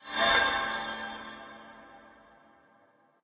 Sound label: SFX Power Healing Potion Loop Start